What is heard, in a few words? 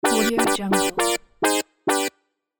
arcade spanish horns fanfare